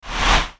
Short Swoosh

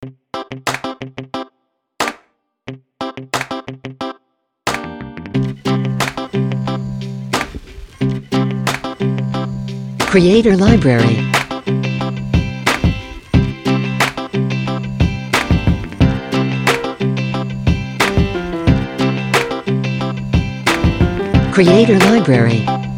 Movie Groovy, Hip-Hop music

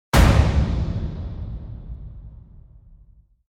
Trailer Hit

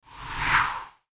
Short Swoosh

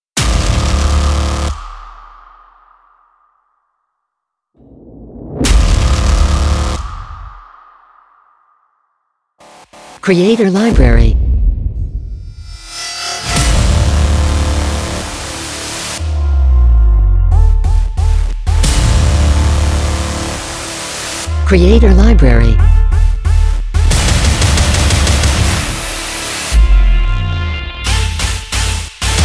SFX Trailer, cinematic music

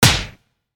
Hard Face Punch

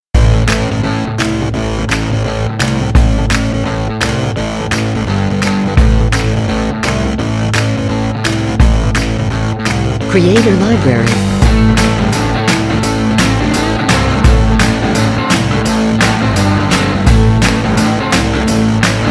Rock-and-Roll

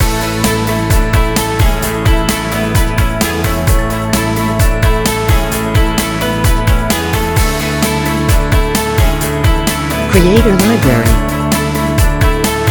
Inspiring Motivation, corporate music

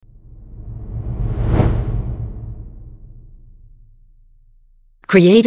Swoosh

